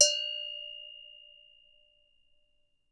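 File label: cow, left, Percussion; Miroslav Vitous - Percussion & Harp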